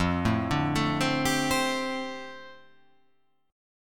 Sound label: F 6th Add 9th F down, chord